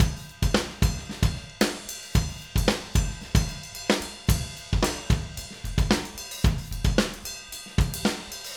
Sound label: Acoustic Live Drum; Platinum Audiolab